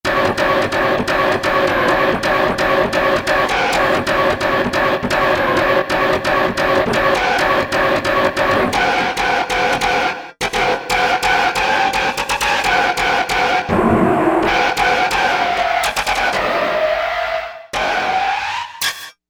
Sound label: Screacher